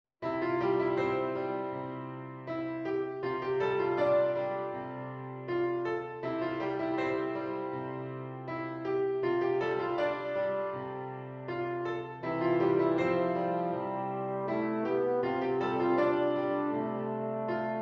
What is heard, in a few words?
Beautiful Reflection Euphonium